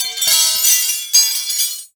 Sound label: Knight Die Clang; blastmorph pinball; xbox series xs